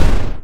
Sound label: Attack; moon gaming